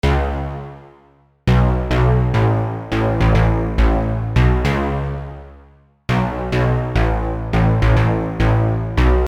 pluck, Pluck Synth

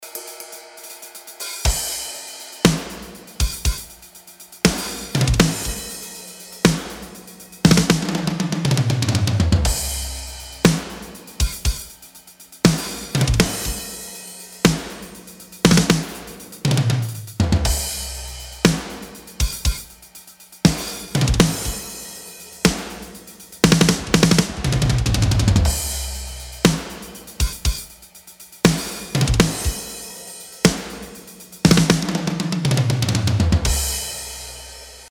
drum, Snare Mixing Metal

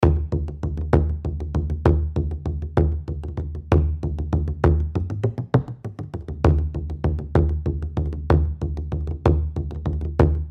bodhran

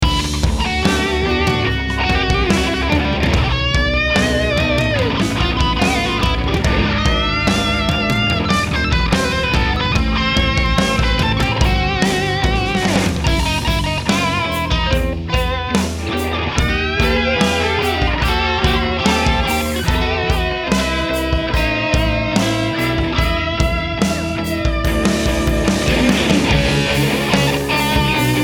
drumbus; altec

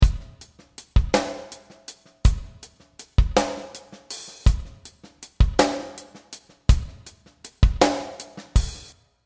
lx480; relab

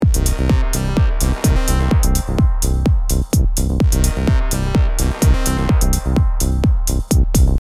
mixdown synth; d16 phoscyon

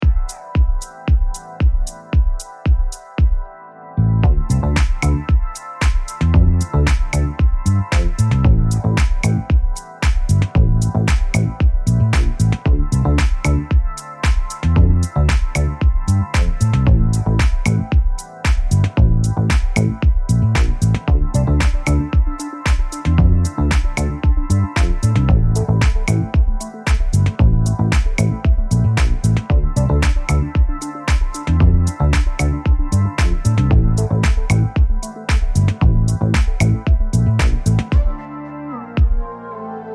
midnight sun basslines, House; Avenger

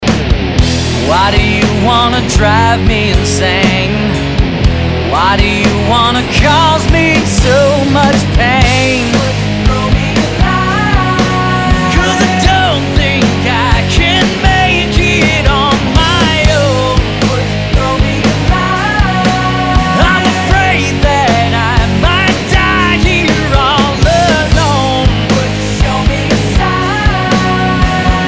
8bit

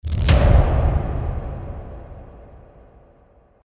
big boom hall

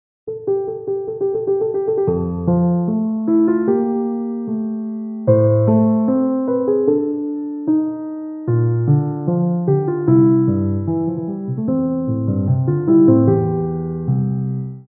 baby grand, Klavier

